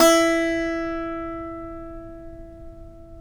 SAZ; Spectrasonics; Hans Zimmer Guitars VOL-2

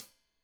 Hat Tight, Hi-Hat, Cymbals; Deeply Sampled Drums

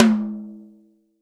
TOM, Real Drum Kit, Rock Kit; Best Service ProSamples vol.43 - Real Drum Kits

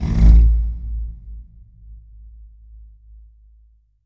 pipe rub; Q Up Arts; Denny Jaeger Private Collection

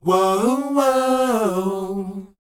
WHOA; ILIO; Vocal Planet

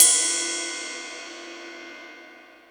Jazz Drums, E-MU Pro-Cussion; drum machine

